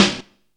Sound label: sick, snares; Zero-G; Funky Elements